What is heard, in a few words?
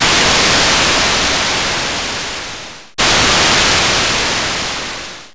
wave